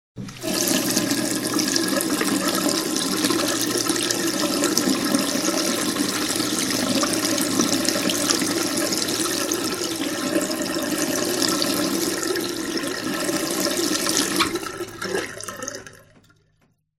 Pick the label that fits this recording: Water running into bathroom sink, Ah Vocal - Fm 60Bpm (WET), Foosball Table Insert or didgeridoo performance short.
Water running into bathroom sink